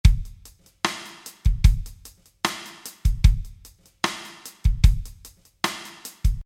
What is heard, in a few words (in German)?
Loop Kompressor